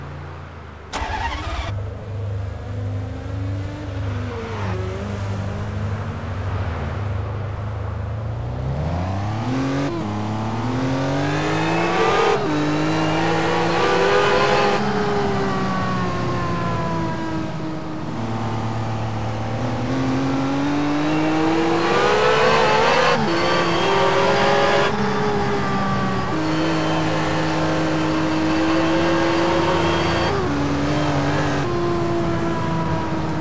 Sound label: maserati mc12; 2004; TDU-1